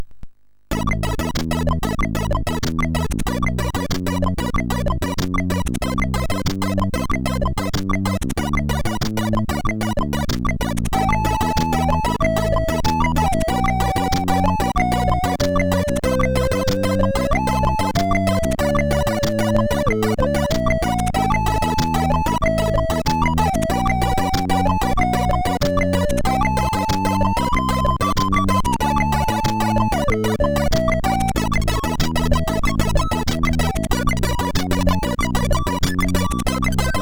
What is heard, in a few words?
New Bossa, sid MOS6581R4